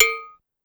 Cowbell Light, Drum Machines DDrum-AT Pitched Down; 300 Drum Machines; Clavia